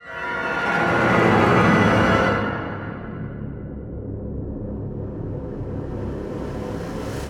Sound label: near Win Riser; netent